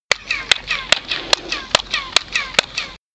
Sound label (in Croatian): pljesak